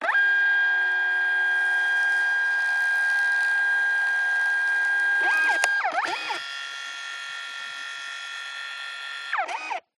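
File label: Printer Printing Individual Cycle Servo Motor Toner Close Perspective; DPA4060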